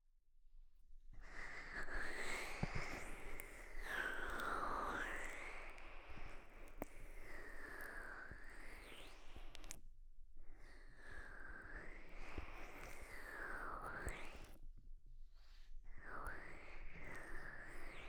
マッサージ音のみ 左

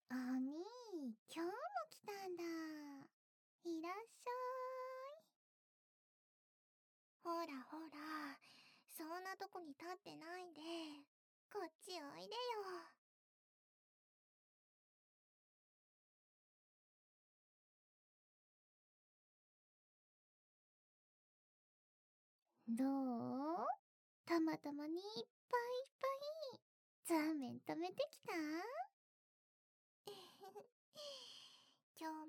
いたずら姉妹の射精寸ドメ両耳舐めパンコキカウントダウンチャレンジ

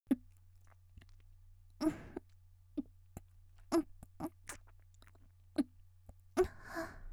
ゴックン（3回）; 綾波系少女

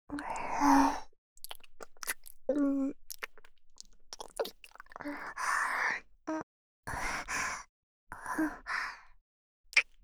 ちゅぱ音系, ASMR; ＳＥなし

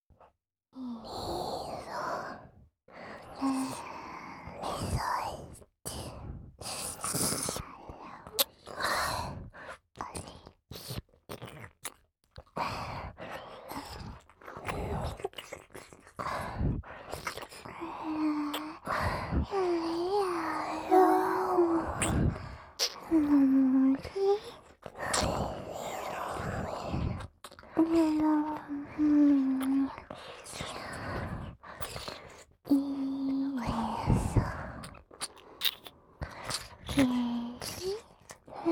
お礼のなめなめ W耳舐め, ASMR

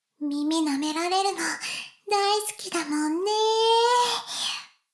耳舐め音声, ASMR; 古き城のドラゴニート

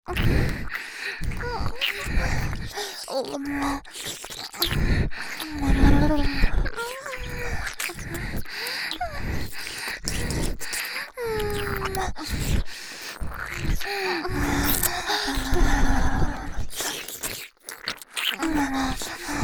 ダブル鼻息耳舐め

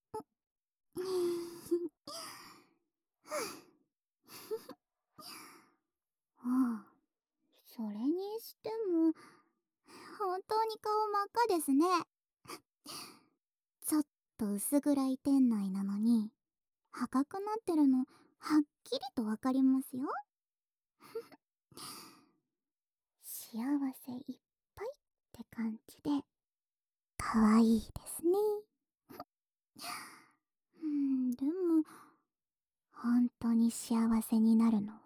エッチな音、響かせてあげますね……♪（左耳の耳舐め）

